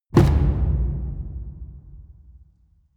drum mid loose

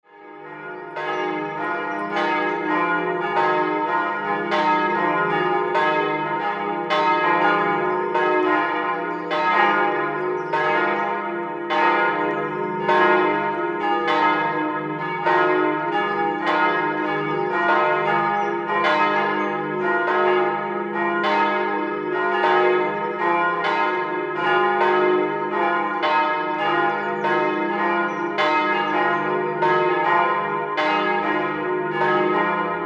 curch bell birds in background

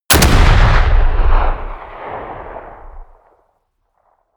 shot

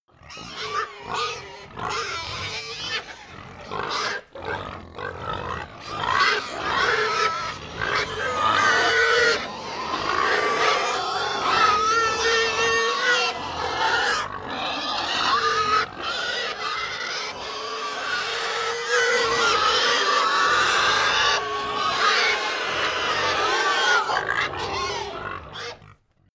pig herd grunt scream many, pig

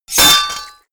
sword impact gore long

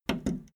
video tape player plastic fast forward click slow

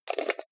jar plastic midsize close fast

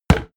hit wood quarterstaff medium

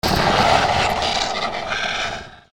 machine scream

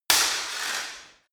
sword hit scrape sword

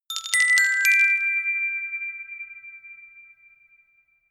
notification glockenspiel rattle sequence jump

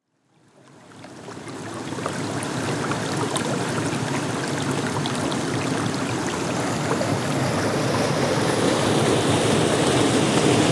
waterfall

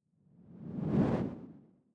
whoosh simple flutter low

